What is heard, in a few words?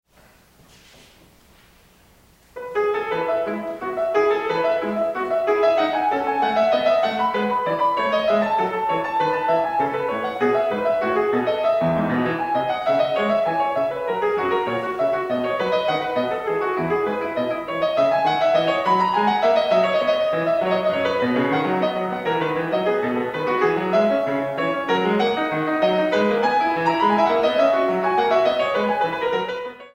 French Suite No6 in E Major, Piano; JS BACH; BWV 817